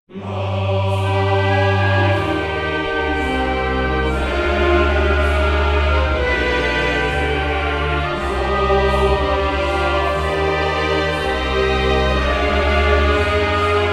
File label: choir